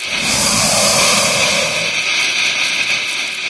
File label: antlion poisonball